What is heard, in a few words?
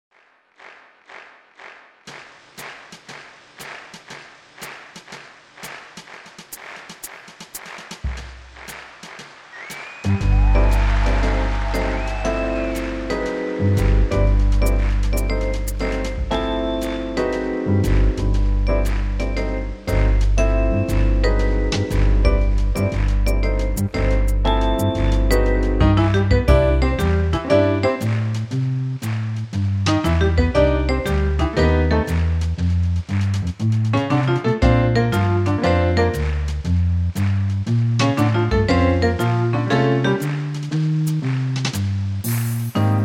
swing